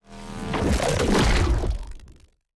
weapon shard accelerator; Stellaris; grand archive

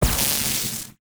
sfx research station lightening; Stellaris; nemesis

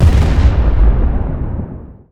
explosion; Stellaris

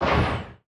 distance energy torpedo fire, weapons; Stellaris

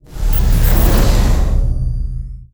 sfx titan moving out; Stellaris; nemesis

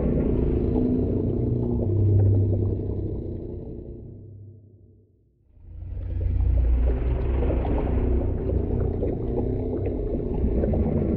void worms troika idle; Stellaris; grand archive; ships

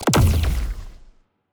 evasion buff hit, weapons; Stellaris; biogenesis

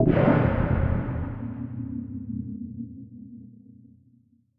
tiyanki reanimated combat vocal; Stellaris

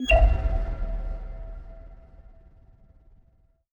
notification subtrait; Stellaris; paragon

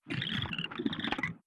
arthopoid greetings; Stellaris

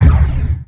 laser fire, weapons; Stellaris

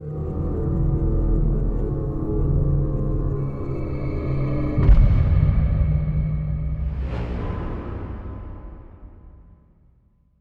ship colossus closing; synthqueen; Stellaris; machineage

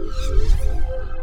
stellarites windup beam; Stellaris; guardians

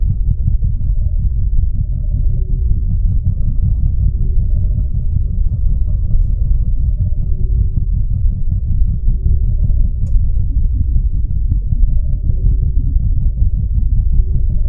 think tank destroyed; Stellaris; utopia

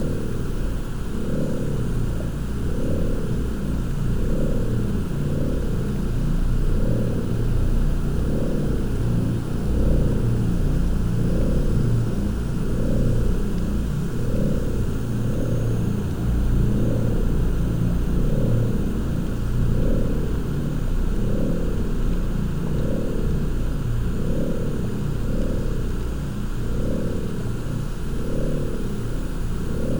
ship transport ship idle; ships; Stellaris; toxoids

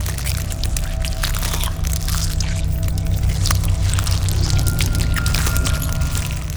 kaiju egg ambience loop; biogenesis

